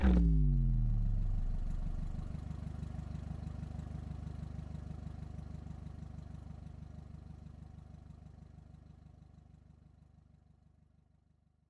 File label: Intro Pod Portal Turn On